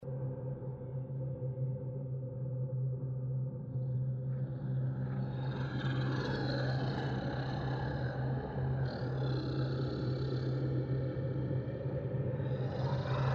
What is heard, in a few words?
Forest Clearing (Ritual); pf2e; the seventh arch